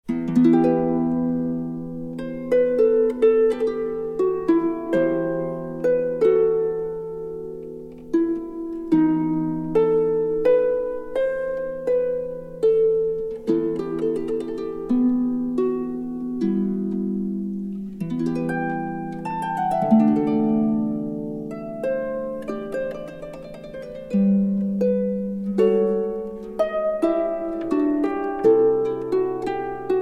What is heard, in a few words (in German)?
sarabande harfe solo